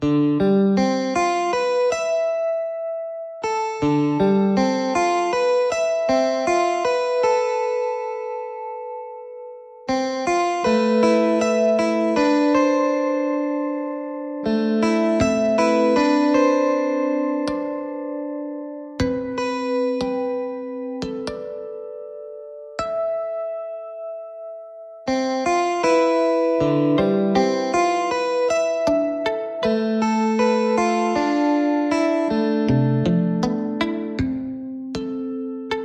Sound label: untitled piece for two electric guitars